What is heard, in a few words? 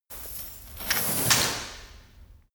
chain mail drop